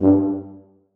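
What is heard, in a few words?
tuba staccato g1; Sonatina Symphonic Orchestra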